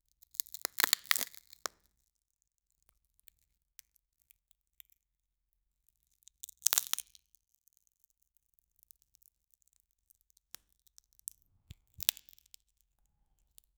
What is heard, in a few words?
soft cracking sound as th